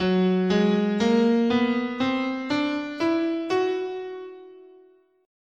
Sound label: Major Locrian, scale, guitar